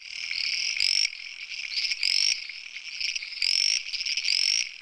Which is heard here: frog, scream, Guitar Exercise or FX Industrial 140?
frog